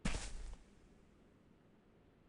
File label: Footstep Grass